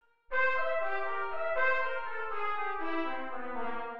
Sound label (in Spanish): Dominante inv0 en C